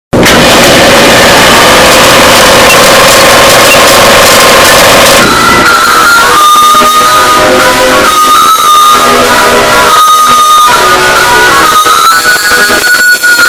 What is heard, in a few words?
earrape intro; puzzlevision